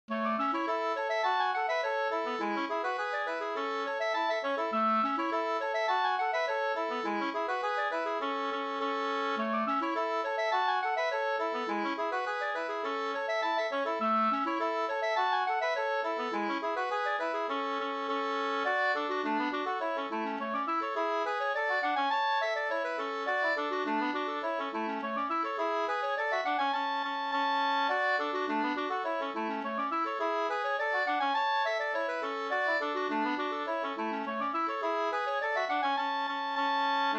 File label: polka polkett; 71an av Goras Anders Ostbjorka 2st